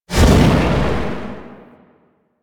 Void Dragon Firebreath Stop; Enemies; Abilities; Warframe; Duviri

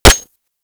Mech, Weapons; Lato Vandal

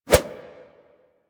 Flip Whoosh; characters; generic foley; Warframe

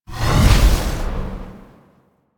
Madurai Void Strike Cast C; Warframe; Focus

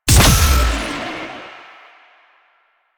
Power Slide Boost Ready Stinger, Motorcycle; Motorcycle Ninety Nine; Bullet Jump

